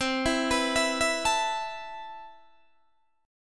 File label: C Major 13th C, Guitar Open D